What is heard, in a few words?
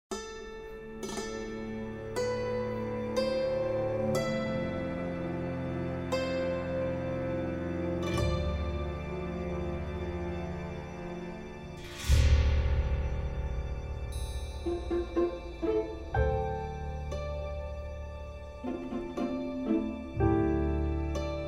Music; Age of Empires III